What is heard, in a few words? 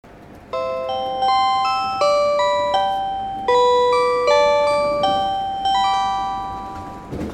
chime; hokkaido